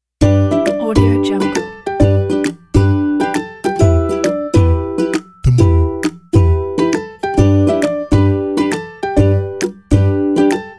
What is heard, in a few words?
happy; hitslab